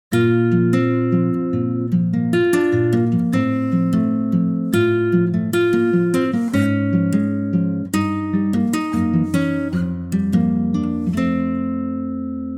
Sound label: Bb B C acoustic guitar; key - Bb to B to C - vocal range - F to G (optional A top note); A gorgeous latin acoustic guitar arrangement